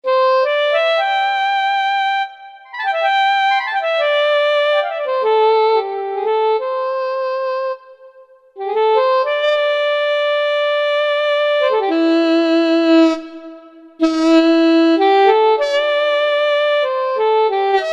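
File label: Bad Sax, Kurzweil K2000, BRASSWIND; Kurzweil K2000 Contemporary ROM; Contemporary ROM Programs and Demos diskette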